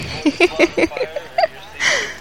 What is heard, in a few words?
silly laugh